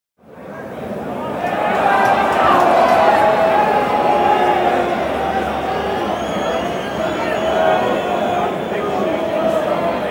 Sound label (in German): Stadion Buhh und Pfiffe